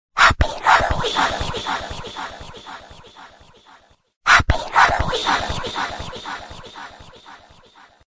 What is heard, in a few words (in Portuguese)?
filhote de cachorro rosnando